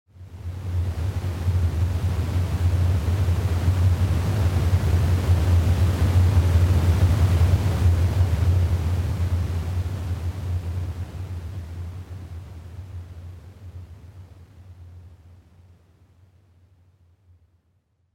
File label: Jet Engine or Burning Fire; castelloe